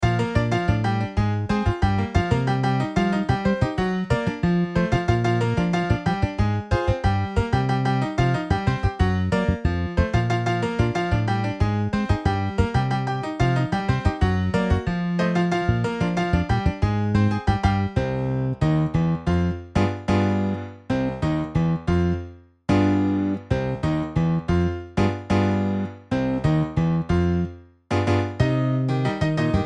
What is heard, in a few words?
Piano Bass MIDI